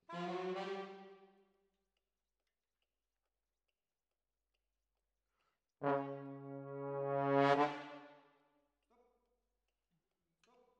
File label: Trombones, jazz for piano